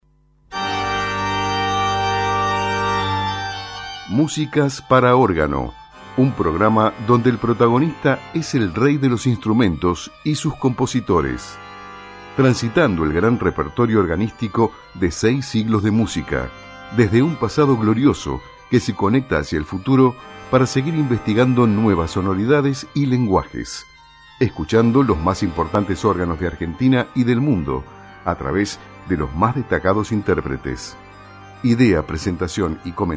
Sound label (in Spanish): MUSICA PARA ORGANO